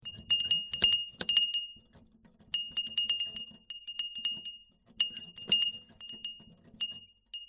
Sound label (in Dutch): kanarieglas